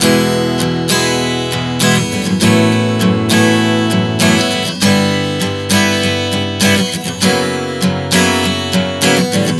Prog 100 E-F#m11-G-F#11, Acoustic Guitar, Chord Progressions 100 bpm; Sonic Foundry (Sony Creative Software); Songwriter Acoustic Guitar Companion